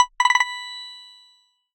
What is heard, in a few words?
alert; android frameworks base; notifications